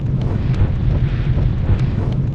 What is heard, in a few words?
PYROKINESIS TARGET